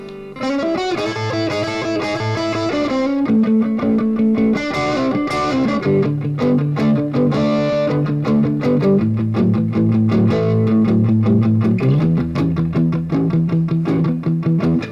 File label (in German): Steg PU overdrive